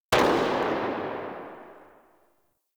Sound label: Miter Tail, Weapons; Warframe; Tenno